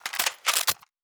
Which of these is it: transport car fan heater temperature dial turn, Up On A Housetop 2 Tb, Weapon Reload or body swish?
Weapon Reload